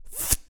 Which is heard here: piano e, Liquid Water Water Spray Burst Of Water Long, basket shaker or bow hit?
bow hit